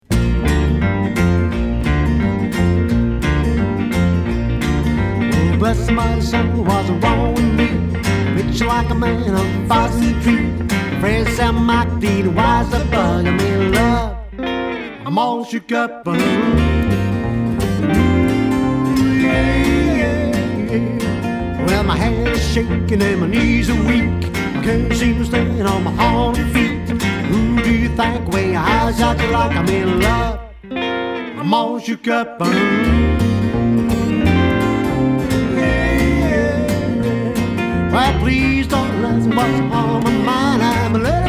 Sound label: Trio